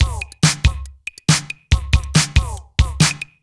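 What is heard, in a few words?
DRUMLOOP ELECTRO 140; Techno2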